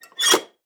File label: Semi Truck Garage; Blastwave